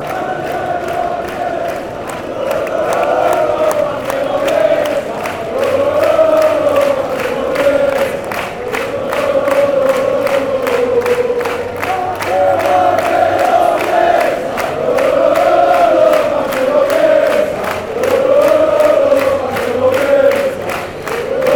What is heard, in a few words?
athletic chant